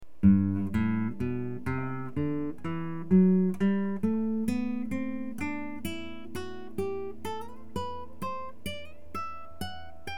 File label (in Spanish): escala sol guitarra